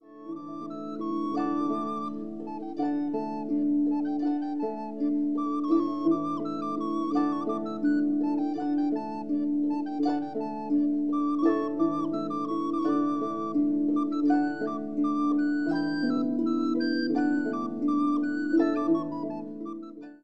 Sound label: harpers frolic kemps jig